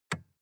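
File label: sports car interior lightswitch soft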